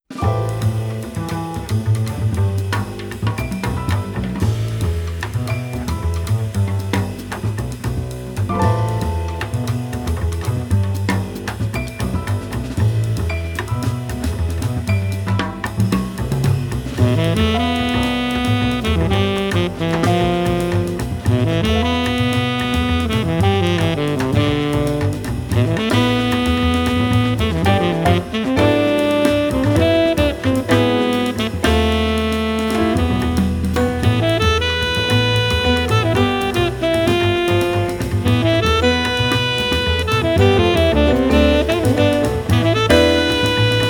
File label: quartet